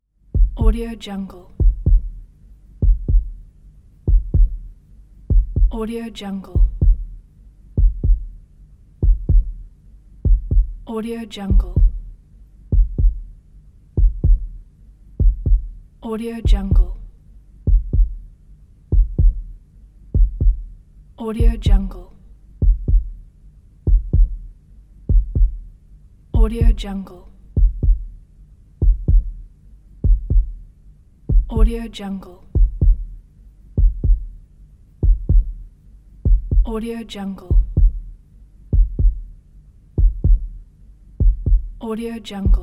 heartbeat

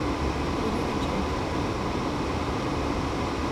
car interior blower motor loop

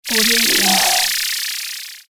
chirpy flutter; sound ideas

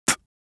human mouth spit

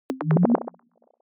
ui scifi bubble approve

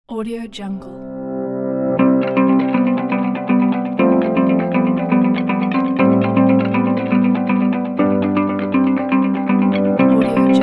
uplifting corporate and inspirational

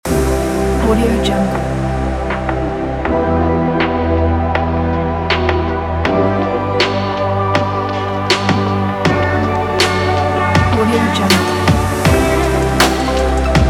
future bass

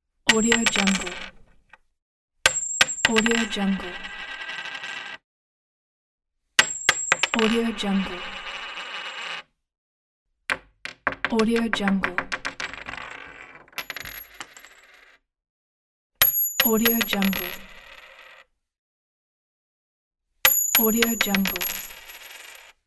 coin falls

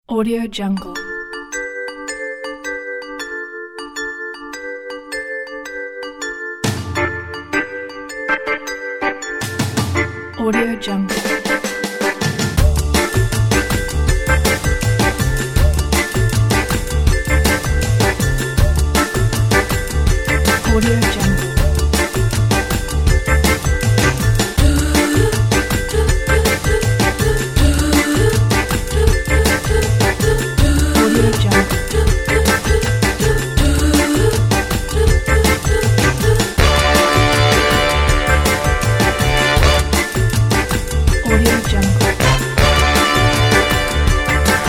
swinging sixties; wademarshall

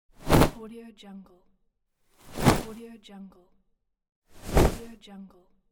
cloth short shake

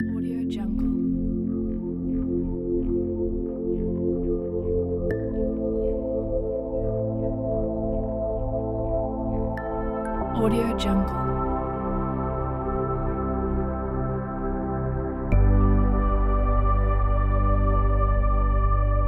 ambient cinematic; music-waves-production

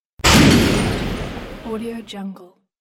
the cannon; 3dhome

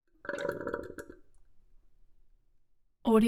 toilet fill gurgle end

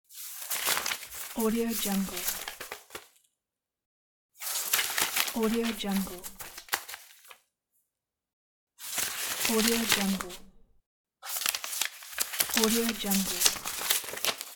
newspaper

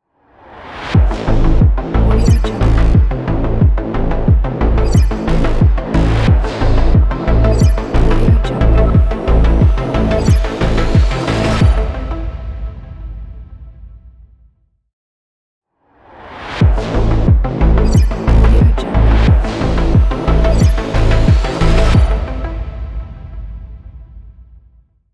dark technology logo

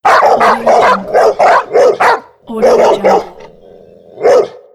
dogs

